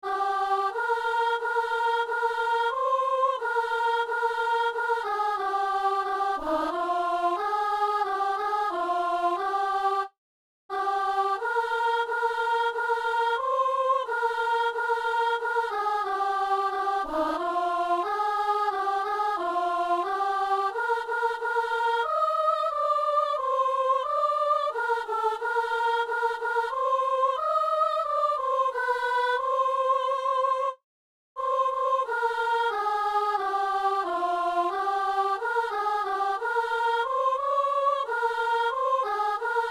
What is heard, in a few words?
soprano; Messe du 17 janvier à Saint-Denis